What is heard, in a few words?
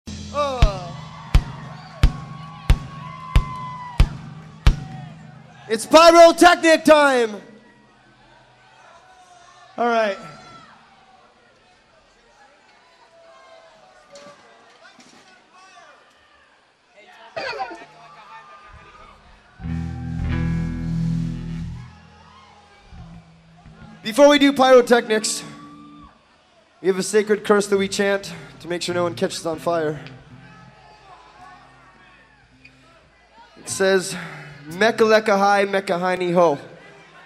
pyrotechnic chant live